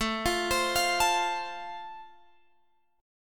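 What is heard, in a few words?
A Minor Sharp 5th, Guitar Drop D, chord strum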